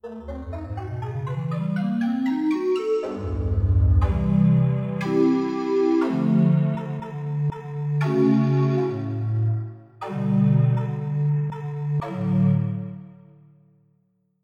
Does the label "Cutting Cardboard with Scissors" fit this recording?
No